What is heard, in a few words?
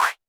Roland TD-20 Percussion